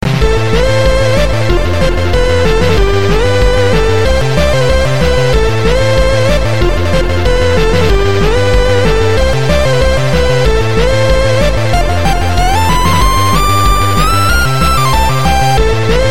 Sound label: keygen music, electronic ringtones